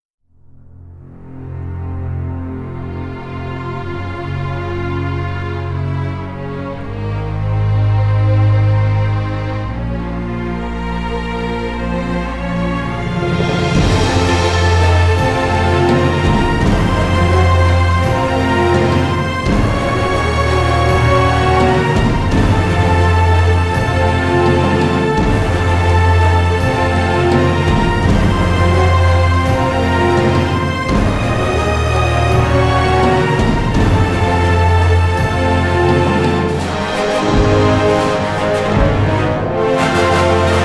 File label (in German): Music Arabisch; rise of venice windows gamerip 2013